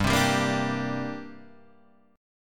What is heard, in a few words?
C 7th Suspended 2nd